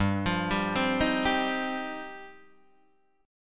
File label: G 7th Suspended 4th Sharp 5th G down Guitar Open D